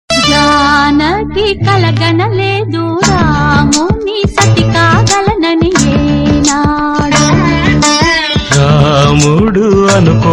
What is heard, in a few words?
telugu devotional